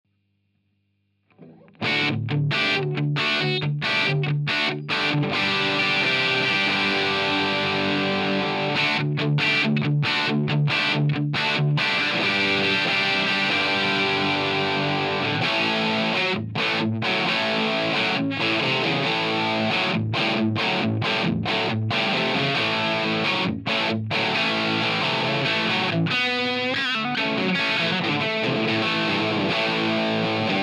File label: Lead; ACE Colt